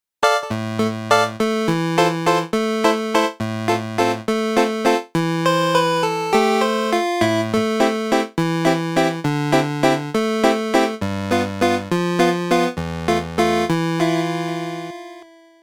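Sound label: Piano vib, electronic keyboard; Piano vibrato; dry instruments; MIDI file of a Bach piece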